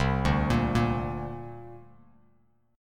C 7th Suspended 2nd, C, down, Bass Standard; C7sus2 arpeggiated; JGuitar

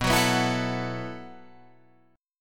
B 7th Suspended 4th B down Guitar Open F; B7sus4; strummed

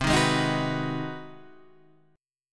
G sharp 7th Sharp 9th

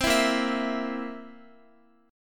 B flat Suspended 2nd Flat 5th chord, down, Guitar; strummed

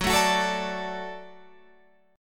F sharp Minor Sharp 5th F sharp Guitar Open F; strummed; JGuitar

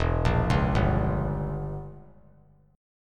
E Diminished 7th E; Edim7 arpeggiated; JGuitar

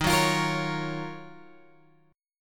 D sharp Minor 9th; D#m9 Chord {11 9 X 10 11 9} strummed

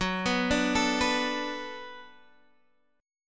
D 6th Gb, chord

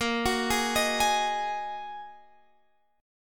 Bb 7th Suspended 4th Sharp 5th Bb Guitar Standard; Bb7sus4#5; arpeggiated; x,13,16,13,16,16; JGuitar

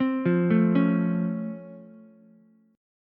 Db Augmented Major 7th, down, Ukulele; arpeggiated; JGuitar

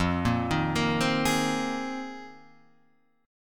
A sharp 9th Suspended 4th F, guitar

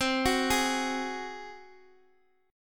G sharp 7th C

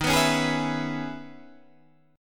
Db Minor 7th Eb

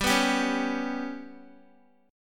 G Major Flat 5th Guitar, chord; Listen to GMb5 strummed

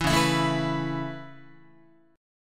Db Minor 7th Sharp 5th Db Guitar; strummed; 7,9,7,7,8,10; 4A,4E,5A,5D,5G#,6C#

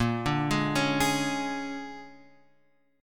A sharp 7th Sharp 9th, Guitar, Open F; arpeggiated; x,5,2,3,4,3; chord; JGuitar

